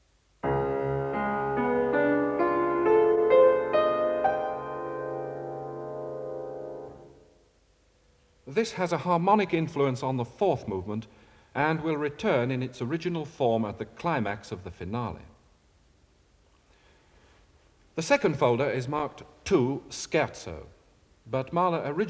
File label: Music Example (Piano)